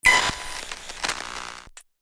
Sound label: isolator; Nothing Phone (1)